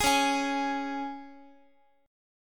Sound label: Db 5th Db down Guitar; Db5 strummed; JGuitar